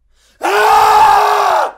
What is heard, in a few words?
screaming